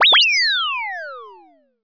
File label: fly